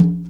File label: Conga, R&B Drums; Percussion